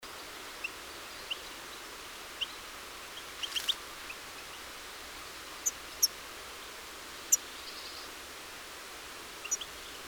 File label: Carduelis carduelis; SETE